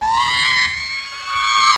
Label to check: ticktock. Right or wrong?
Wrong